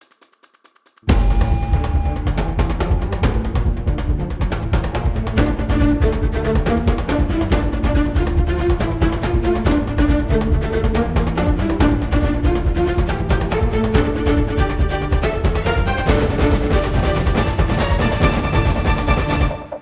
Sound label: Action Rhythms